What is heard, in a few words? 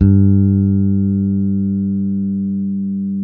SAD4 SUSTAIN, Bass; Sampleheads; Will Lee Bass Library